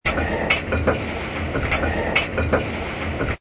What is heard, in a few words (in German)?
zug